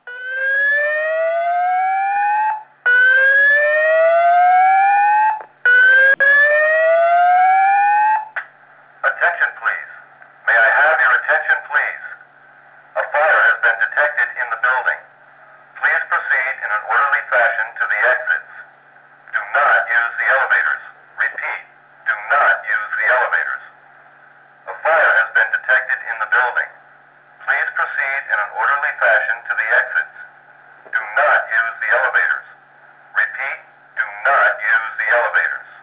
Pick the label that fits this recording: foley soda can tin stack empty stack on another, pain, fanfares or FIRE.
FIRE